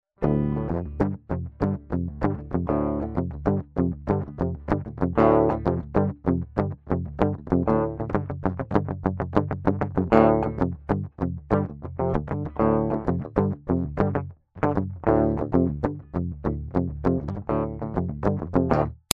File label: Heavy